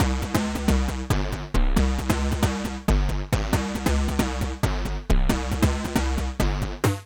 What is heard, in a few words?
F Minor 136 bpm, Bassline; MIDI Clips; Tonal; Field Marshall; Skitter and Step; Ableton Factory Packs